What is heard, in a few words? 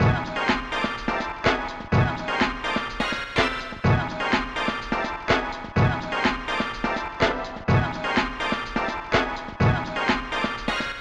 dreamcast beat; old music from the soundcloud days